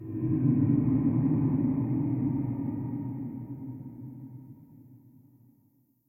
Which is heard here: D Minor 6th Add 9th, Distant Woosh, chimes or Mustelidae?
Distant Woosh